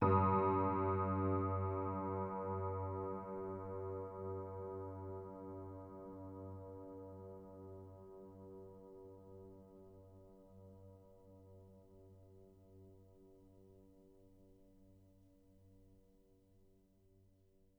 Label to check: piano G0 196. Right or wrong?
Right